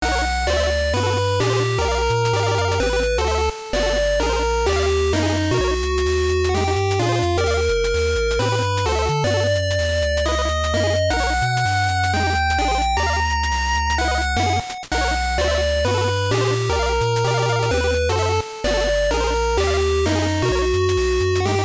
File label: Chiptune